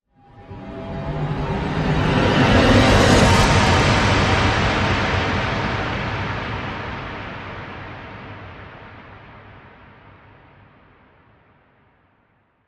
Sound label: Rev Open Air